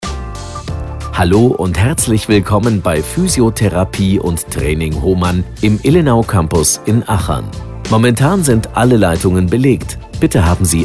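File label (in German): Warteschleife, telefonansage praxis für physiotherapie; Hohmann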